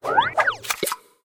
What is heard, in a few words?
SMS